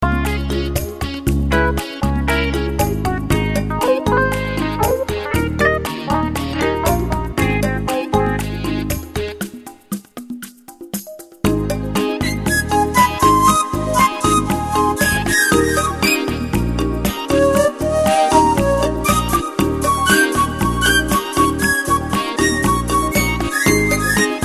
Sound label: Funky Cha Cha